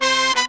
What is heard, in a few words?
MERENGUE